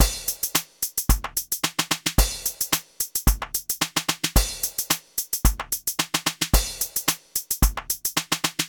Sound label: Drum loop